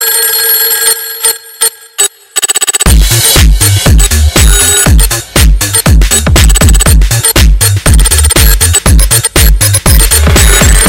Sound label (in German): Alte Telefon Remix